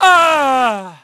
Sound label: DEATH; PHANTOM; Pulse Racer